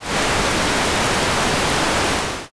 fire

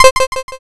toggle off

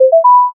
success

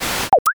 gacha roll

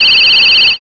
whistle long

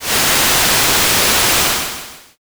rain

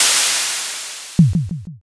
airlock

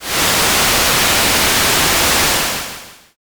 rain

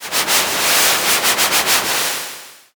river

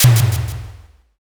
pistol

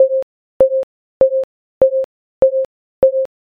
railroad

